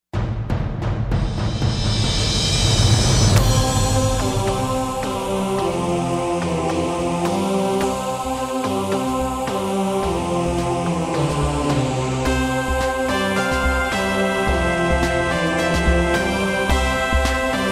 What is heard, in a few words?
Chariot Fanfare